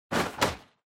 Motion Grab Gentle; モーション 掴む; 弱

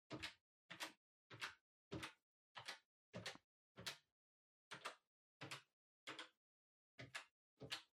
Calculator Far Single Gentle

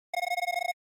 NES RPG Message

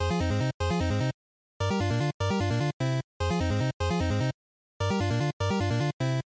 NES Shooter Power Up Loop150

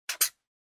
Felt Tip Pen Check

